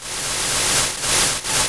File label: boss spray; Gnat Attack; Super Mario Paint